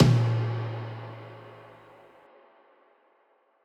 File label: tom one shot